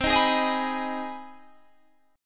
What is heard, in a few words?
C Minor Major 7th Sharp 5th, Guitar, Standard; CmM7#5 strummed; JGuitar